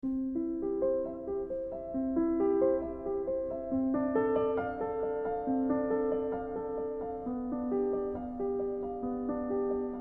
C major prelude mixed with its frequency time invarying envelope